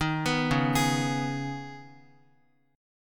C sharp-7th Suspended 2nd-C sharp-x,x,3,4,1,4-8-down-Guitar; C#7sus2; arpeggiated; JGuitar